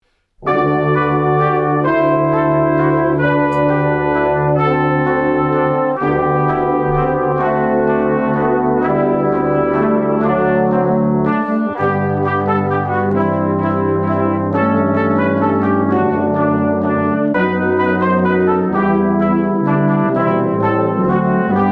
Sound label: We Wish You A Merry Christmas soprano